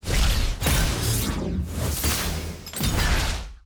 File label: sfx brackets matchup intro; clash; lol